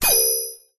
science fiction computer refuse entry incorrect tone